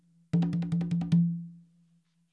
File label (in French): frise, congas